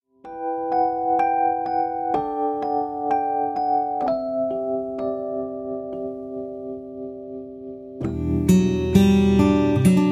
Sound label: Instrumental